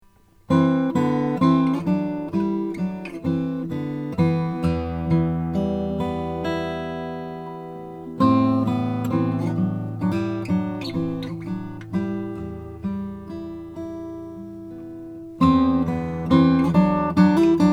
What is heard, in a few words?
brondel a 2 church bell cocobolo